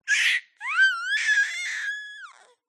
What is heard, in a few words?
six months old baby boy feeling happy and squealing; Sound Ex Machina